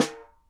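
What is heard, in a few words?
snare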